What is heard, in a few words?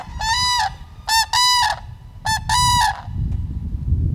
Unison Eurasian Pair, cranes